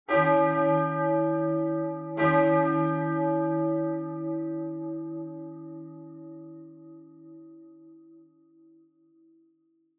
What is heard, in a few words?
Church Bell, Low Mid